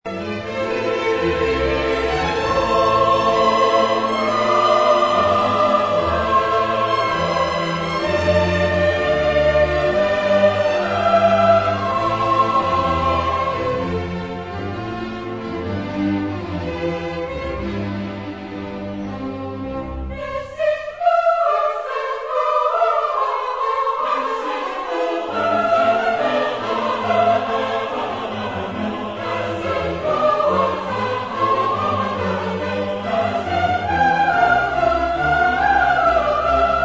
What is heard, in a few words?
Cantata, chorus; Bach; BWV61; Nun komm der Heiden Heiland